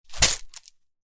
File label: Coin Purse; game inn